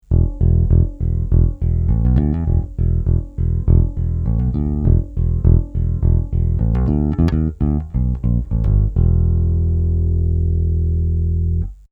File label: Ampeg SVT Ch1 Normal